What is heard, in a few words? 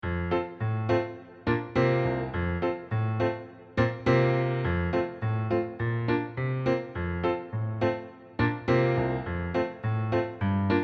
soprano